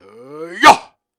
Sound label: Grunts and Chants, Viking Vocal